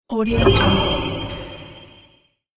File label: magic noises